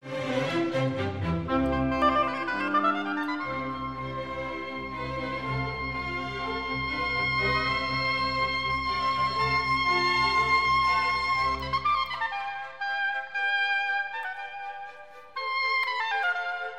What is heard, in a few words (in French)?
hautbois; Mozart